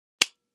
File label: Light Switches Click